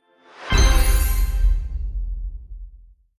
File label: ui pve clearance